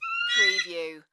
American Bald Eagle squawk

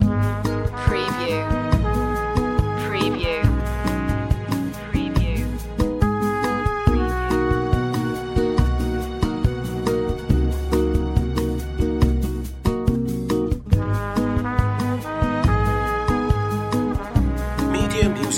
yoga bossa loop

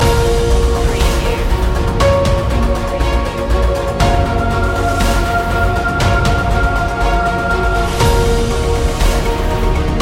villain loop

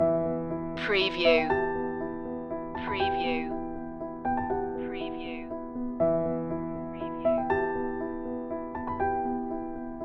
piano loop

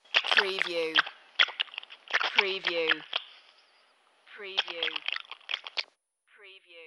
Dog Lapping Vintage

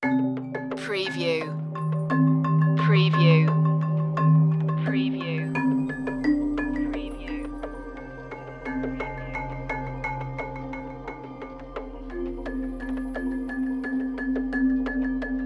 ambient rainforest

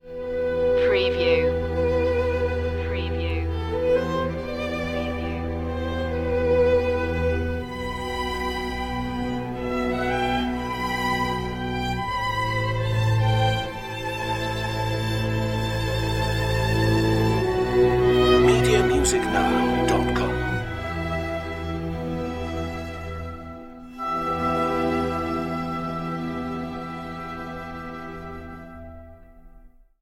dramatic interlude

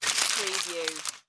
Tin Foil Crumple

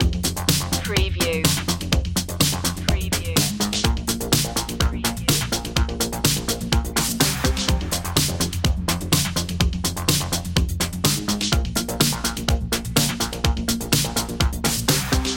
80s drum and bass loop

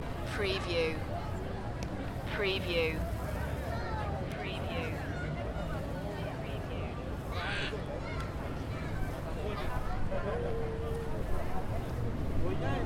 City Ambience, Athens Greece

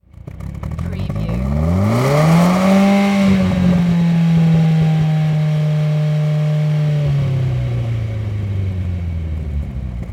NISSAN SILVIA EXHAUST LONG REV

